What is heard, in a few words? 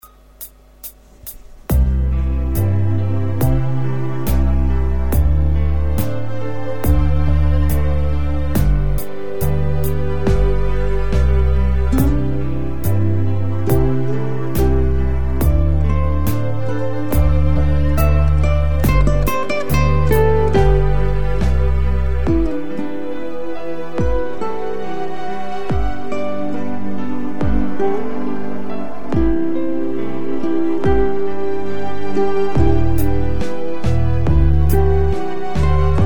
piano and guitar